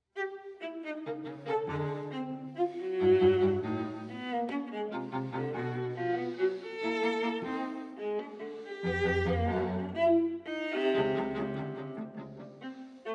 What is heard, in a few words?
string quartet intermezzo allegro moderato; String Quartet No. 3; Op. 30; III. Intermezzo